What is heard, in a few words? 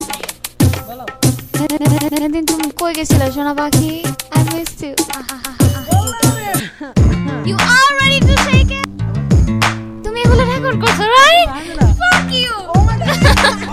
bangla ringtone; hip hop